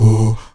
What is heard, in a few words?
AHOO A 2E, Heart of Africa, STAC.OO-V; Spectrasonics